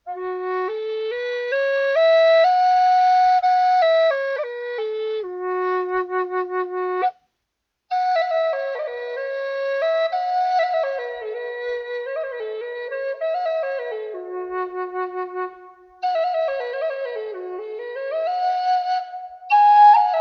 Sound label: handmade western cedar native american flutes